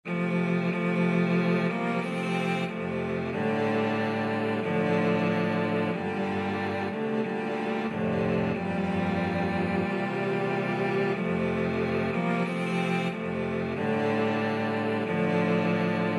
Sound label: cello quartet